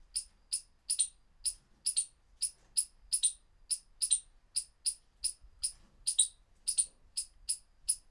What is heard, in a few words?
Funambulus pennanti bark; Northern palm squirrel; Barking sounds of a male, courting a female but is bewaring of her